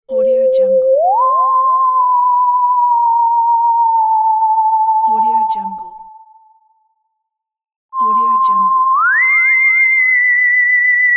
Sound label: ufo